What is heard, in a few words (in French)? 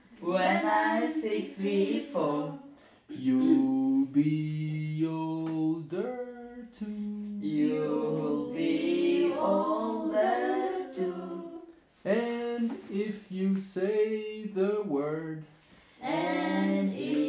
répét chant école